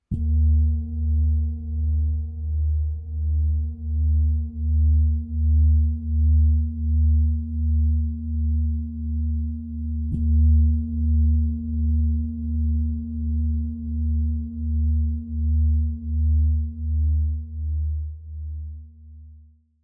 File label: Himalayan Singing Bowls; for Money Luck and Prosperity